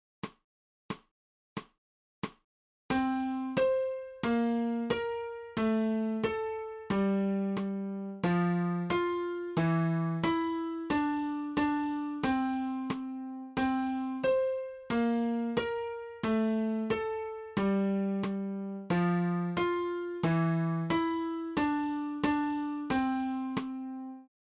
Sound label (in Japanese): 新フレットレス